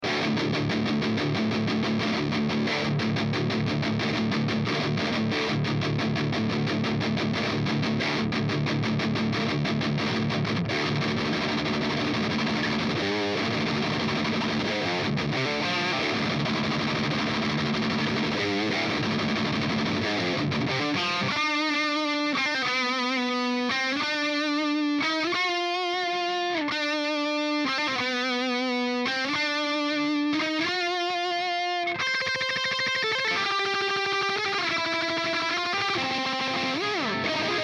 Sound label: Seymour Duncan Nazgul JCM800